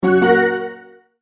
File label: multimedia tone start